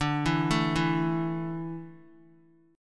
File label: D Suspended 2nd D down Guitar, chord; arpeggiated